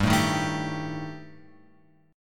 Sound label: G Suspended 2nd Sharp 5th; Gsus2#5; {3 0 1 2 X 3} strummed